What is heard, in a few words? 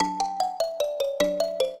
trio, mbira; Mozart